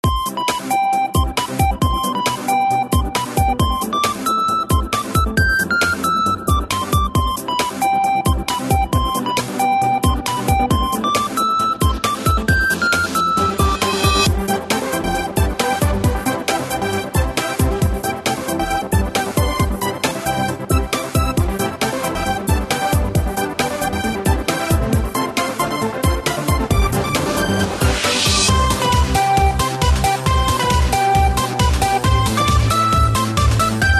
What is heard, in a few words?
BGM